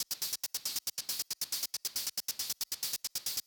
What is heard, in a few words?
138BPM Loop; Drums & Perc; F major; Vengeance Samples; Another Day Kit